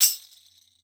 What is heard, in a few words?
TAMB; Xsample VOL-9